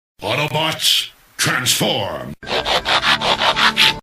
AUTOBOT TRANSFORMERS sound effect

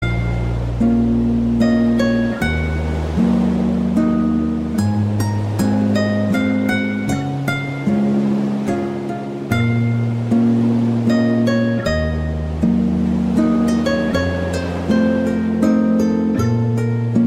Relax Voilen

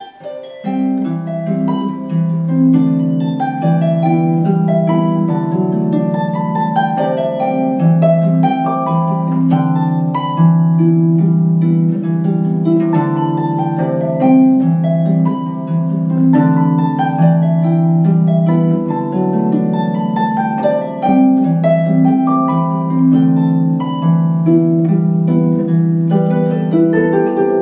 Harp

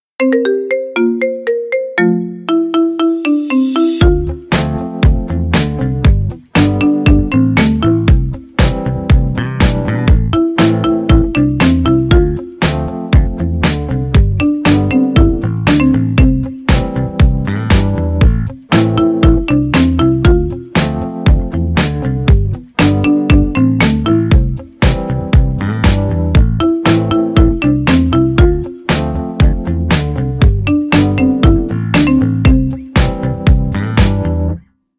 Marimba

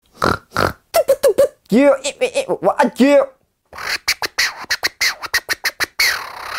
Vocal Scratch

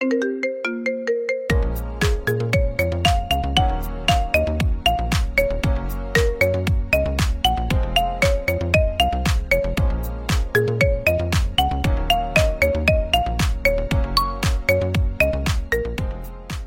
Marimba Remix

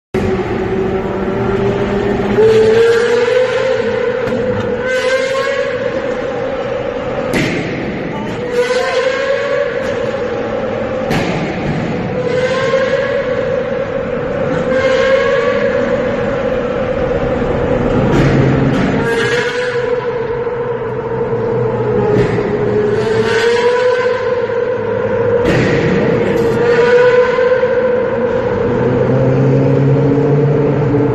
Pagani Zonda Tunnel Sound

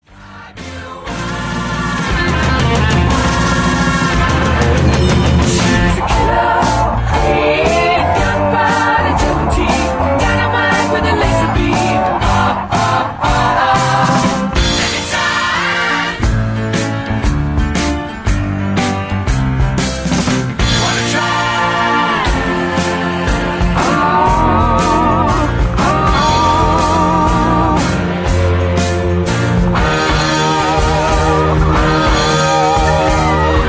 Instrumental

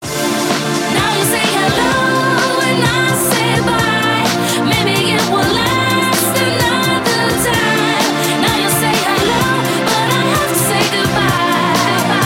pop mix

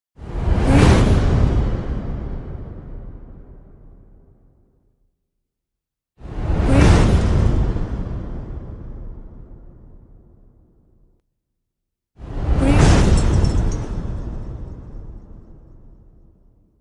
Cinematic Whoosh Hit

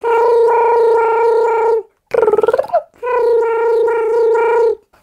Alien

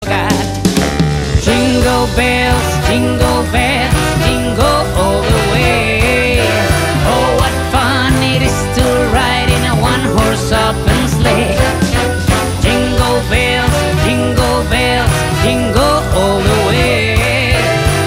Jingle Bells

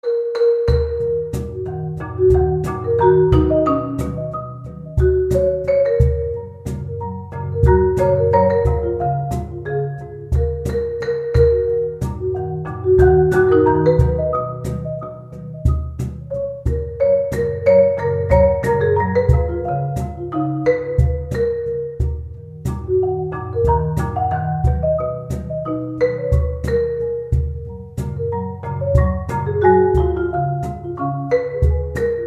Marimba

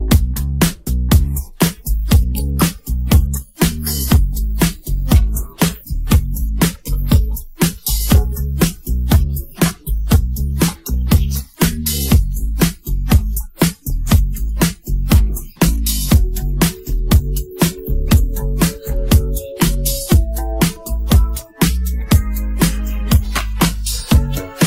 Instrumental

